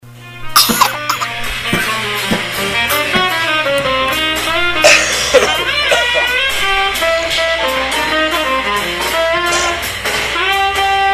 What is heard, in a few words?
quartet; bargajazzclub